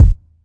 tight low end kick drum single shot D# key

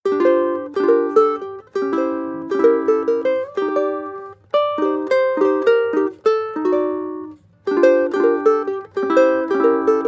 guitarrico jazz sequence; loops

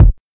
dope bass drum one shot f sharp key

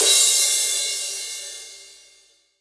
big room urban crash single shot a sharp key

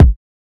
small reverb kick drum one shot B key

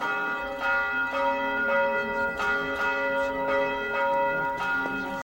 bells chime stereo and distant

